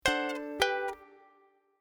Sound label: glockenspiel ukulele ringtone; Papagenos